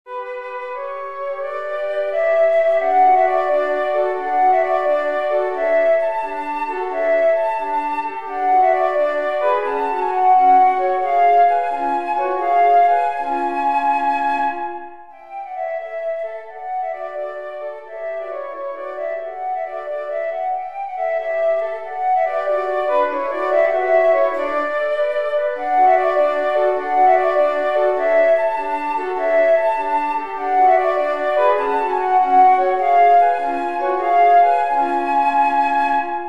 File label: flute trio